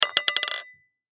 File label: Tin Can Knock Over, Tin Can Empty Knock Over Wobble Cement; Wobbles; Foley